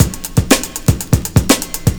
cracker, breakcore breaks

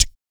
Blues Soul; BOOGALOO; Spectrasonics; Vocal Planet

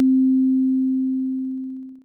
synth, amiga med

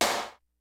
HOUSE KIT, DRUMS; Kid Nepro; Elite

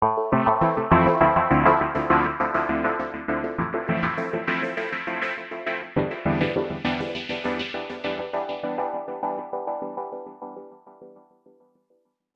D&B Pad Loop; Keys; neuro

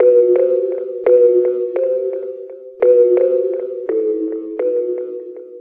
160, Eb, Keyboards; Total Drum&Bass; 90 s Sample CDs

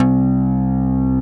SYNTH UMB; AMG - Global Trance Mission VOL-2

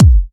Electro Kicks

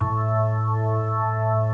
HAM A4, KEYBORD

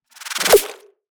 Drums Snare; neuro; BIG5AUDIO

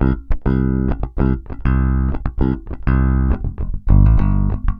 BAI BS C, BASS BAION

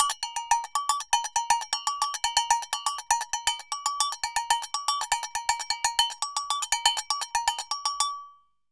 Agogo Samba 120 bpm; Best Service; Festa Latina VOL-1

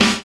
DANCE SET, DRUMS

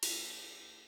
ride, Millenium MPS-400

